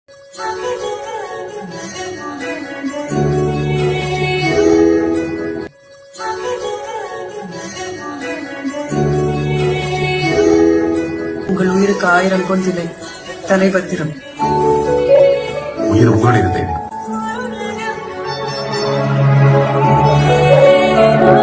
song bgm ringtone tamil bgm; karthi

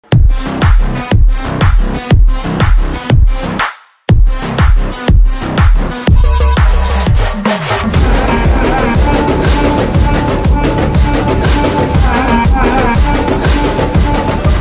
bgm ringtone; animal x mangalavaram

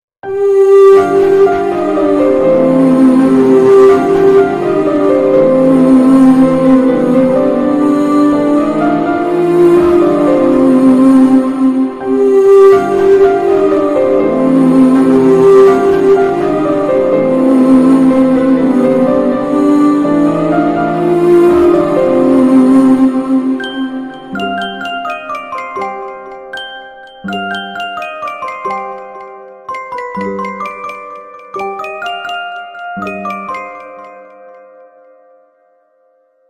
heartwrenching background music sad bgm; raayan

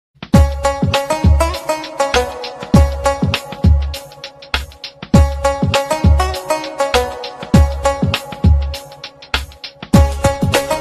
ringtone bgm ringtones

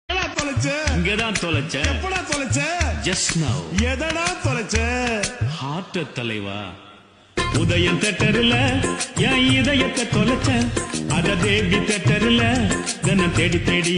viral tamil dance

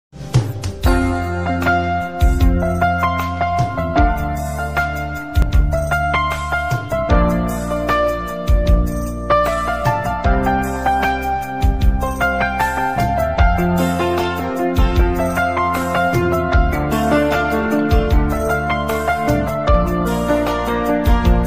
most romantic instrumental tune

